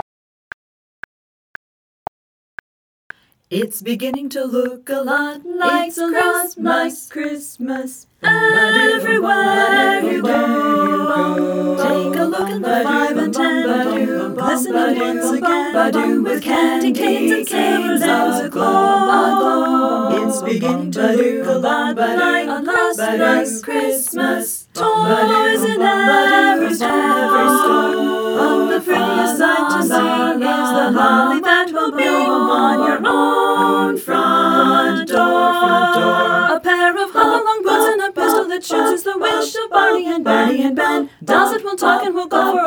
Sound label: with clicks; christmas